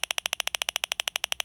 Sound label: 555 click